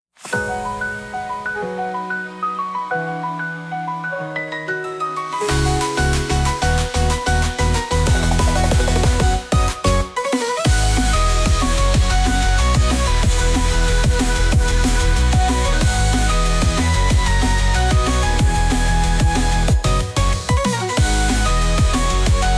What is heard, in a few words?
kawaii future bass